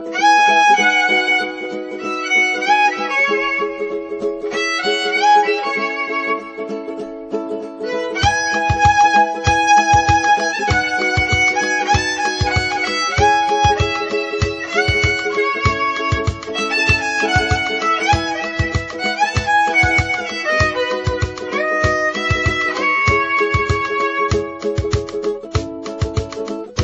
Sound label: violin remix